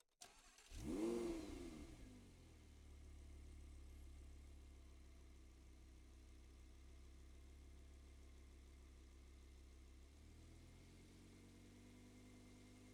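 Audi, R8, Onboard, Start, Fast, Drive, Stop, Reverse, Stop, Drive, Engine, Right; DPA4061; Pole Position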